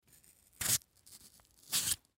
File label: Potato Peel Skin Slow; Food; Foley; Blastwave